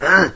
zombie pain; doom3

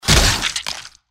gecko death; npc

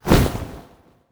wing

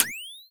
displays on

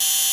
security bot turret rotate; gow

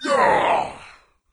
melee; doom3; commando

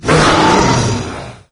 attack, wraith; doom3

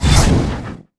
spider monster whoosh

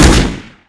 thompson shoot; m1a1; weapons

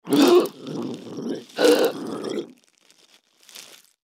feral ghoul alert; npc

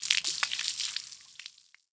body bloodspout; flinder

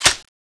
clip in; tfa cso; burning aug

